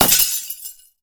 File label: ice spell impact shatter